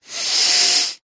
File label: human male inhale nasal hard forced slightly blocked; zapsplat